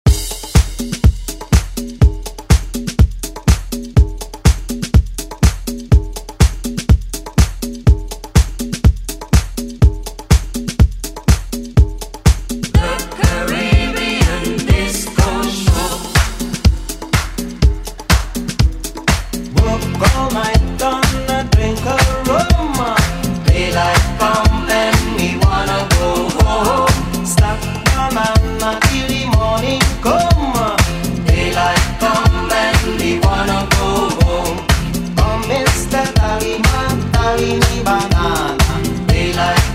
80s Re Drum; DJ Mhark

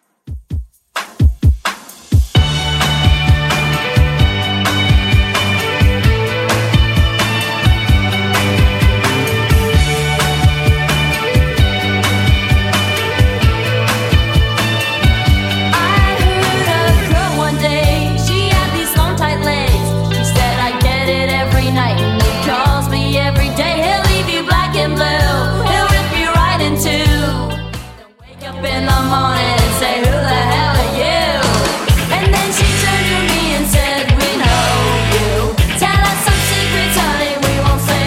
80s Redrum 130bpm

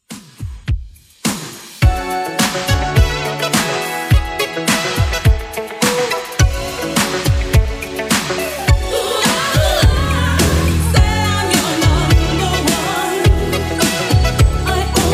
80s Redrum 105bpm

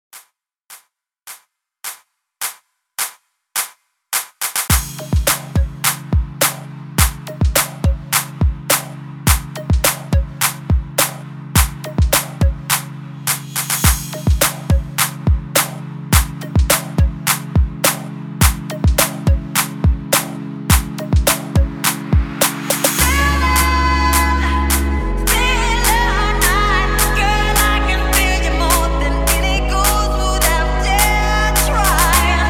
TWERK 105 BPM

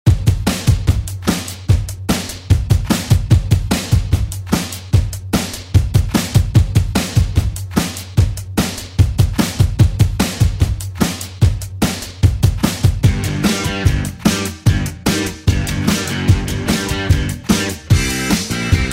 Oldschool; Deejay Hit; Redrum

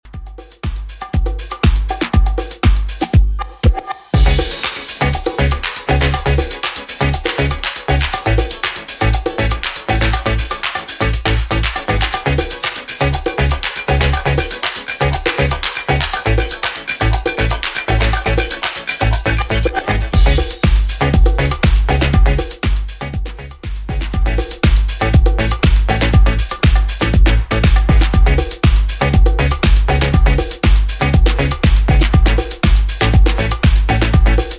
80s Redrum 120bpm

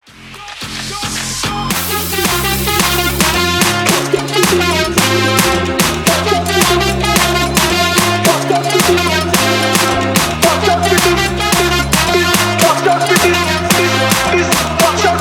Moombahton 110 BPM

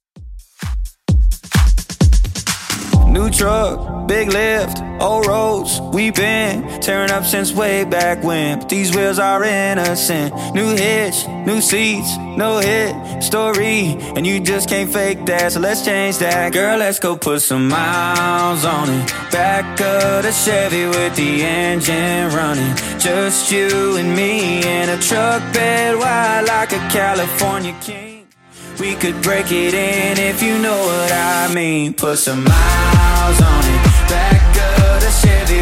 Top40, 130bpm

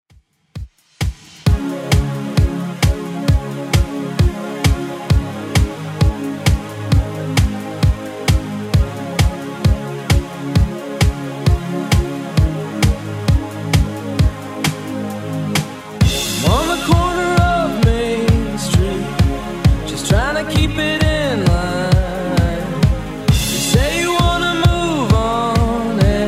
2ks Rock Redrum 132bpm

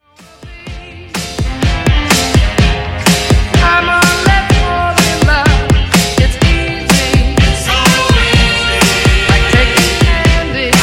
Funk Soul Motown Redrum 125Bpm

